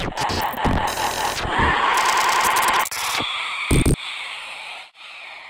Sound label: zombie idle; custom monsters; glitchzombie